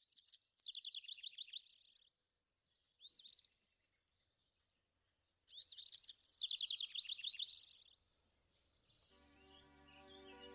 powitanie wiosny z ptakami 432 Hz; Osiem snów

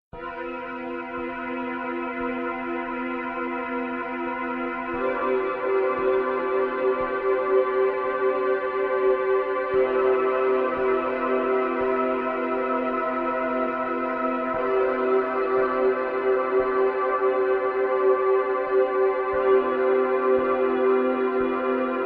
magiczna muzyka, 432 hz, magiczną muzyką instrumentaln; magic heat; magic-music

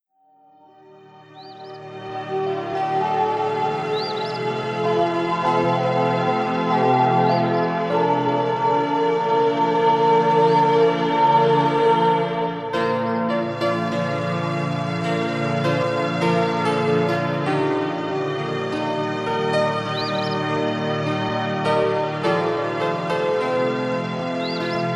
muzyczny miks relaksacyjny 432 Hz; radosny wieczor

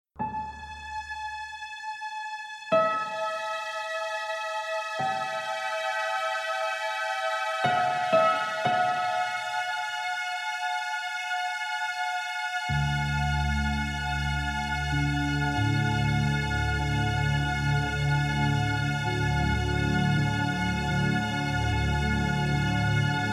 srebrna noc 432 hz harmonia i odprężenie

